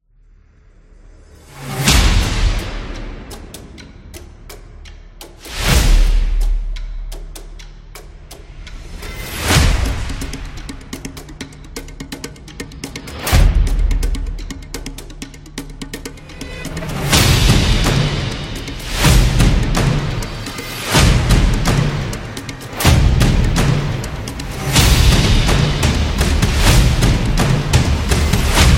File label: African Documentary